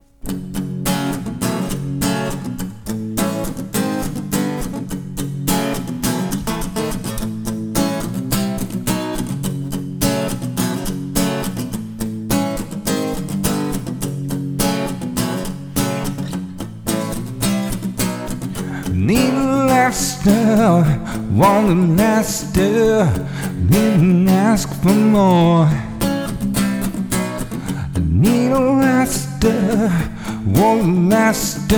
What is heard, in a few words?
Blues; Sketches